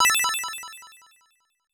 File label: Credit SFX